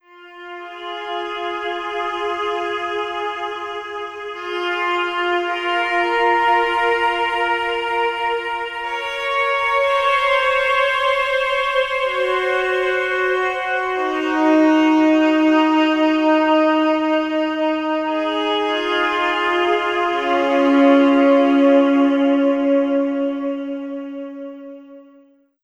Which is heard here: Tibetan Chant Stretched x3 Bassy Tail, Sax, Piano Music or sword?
Sax